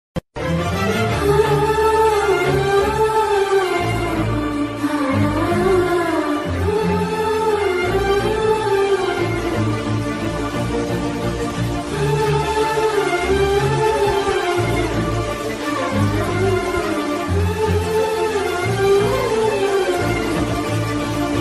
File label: telugu ringtone love bgm ringtones